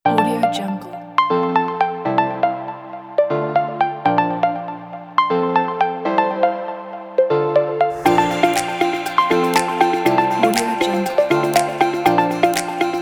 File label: upbeat pop